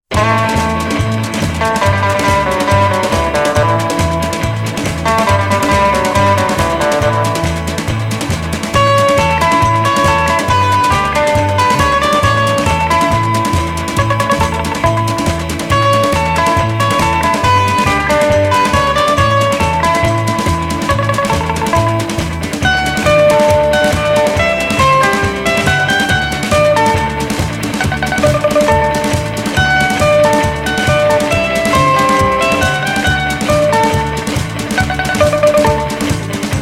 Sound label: instrumental version